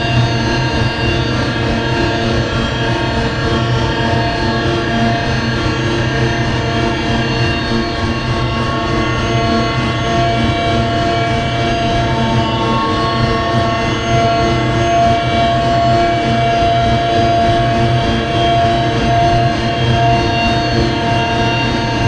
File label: Robot Arm Track Med Close; Boomtown; Titanfall 2